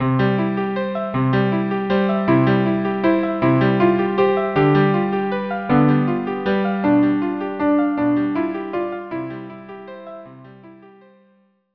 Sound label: cello; On wings of song